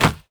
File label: enemy death land leg